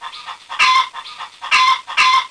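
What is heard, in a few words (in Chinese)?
muji jiaosheng, hen clucking; jiachu jiaosheng; jiaqing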